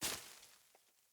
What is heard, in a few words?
Sandals Land Vegetation, footsteps; Player